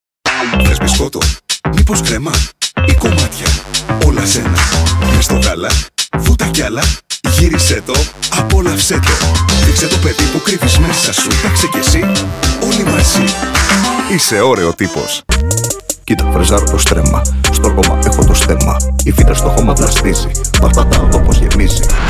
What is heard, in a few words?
Rap